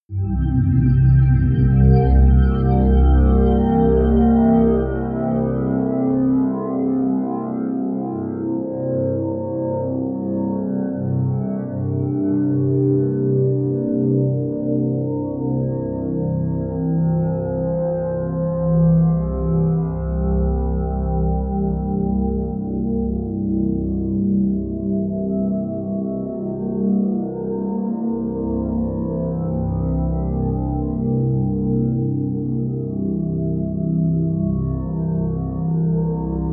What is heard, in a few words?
drone organ